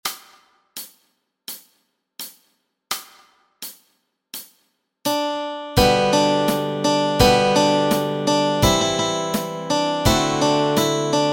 melody and chords

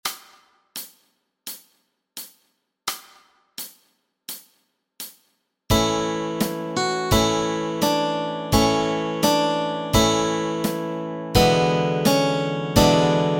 Melody and Chords; London Bridge

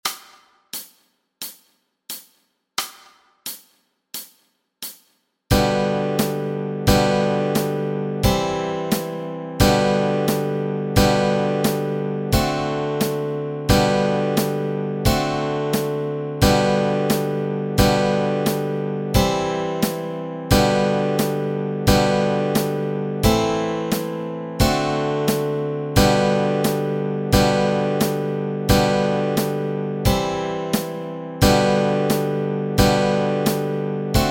chords

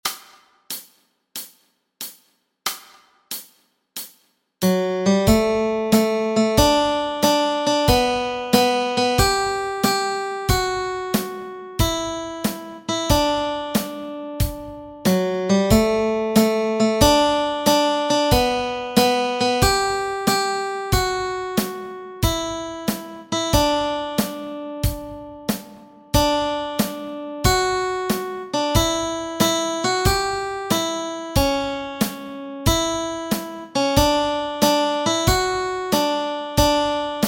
melody

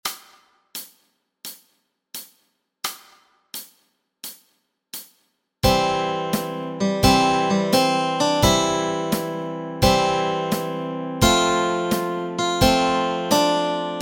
Melody and Chords Track

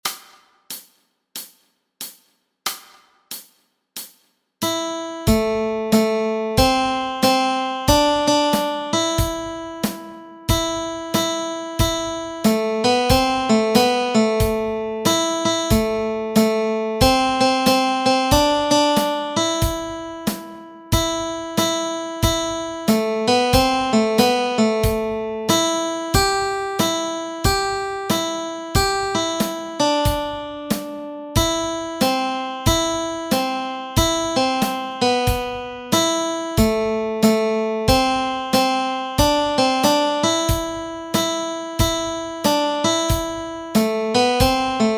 Eerie Canal Melody Track

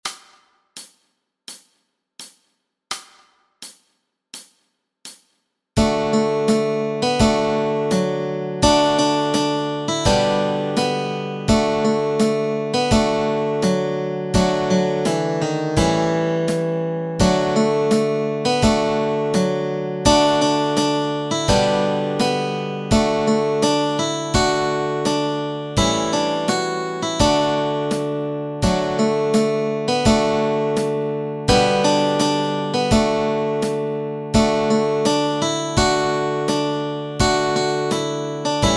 melody and chords

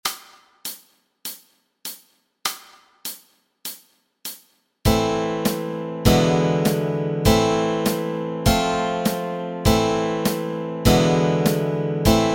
chords, Easy Guitar and Ukulele

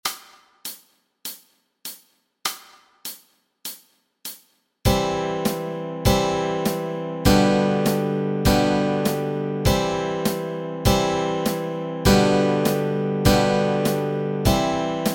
chords, Easy Guitar and Ukulele

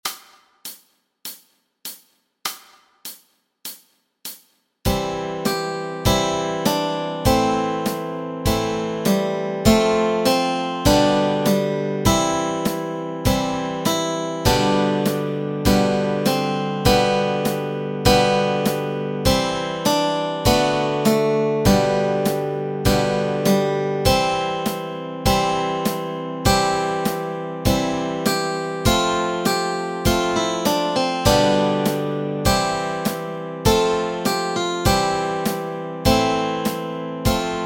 melody and chords

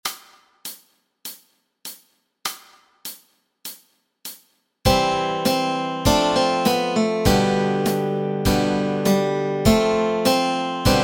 Ding Dong Merrily on High melody and chords

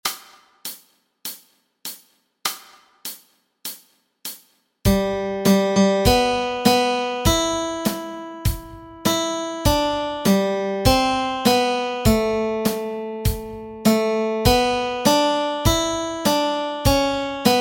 melody

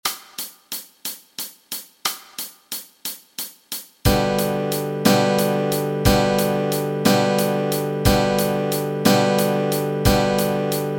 chords, Easy Guitar and

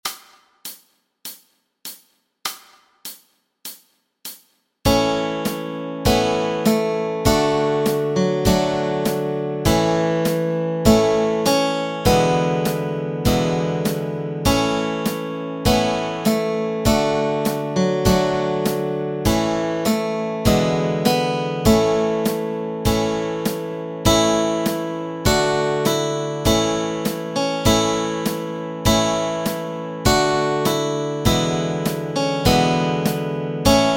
Nearer my God to Thee melody and chords

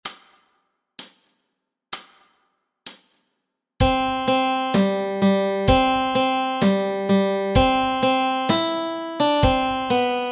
Who Did Swallow Jonah melody

